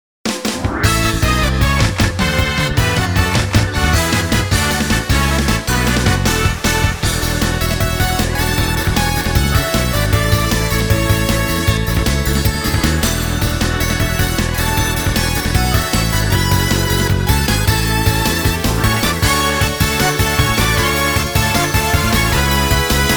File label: Reward BGM; Jikkyou Powerful Pro Yakyuu; Power Pro Music Hall 2; OB Player Acquisition